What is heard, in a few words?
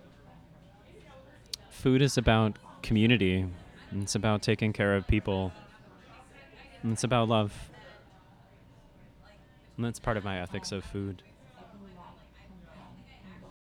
Audience Recording; a-meal-premiere